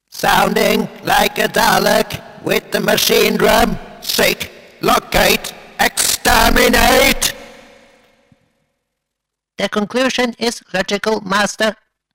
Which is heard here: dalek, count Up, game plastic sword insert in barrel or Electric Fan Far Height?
dalek